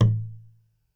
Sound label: Tom Acoustic Crush Hi Phaser